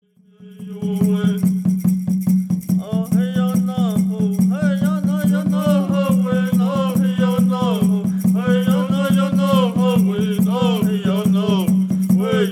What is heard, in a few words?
Peyote Song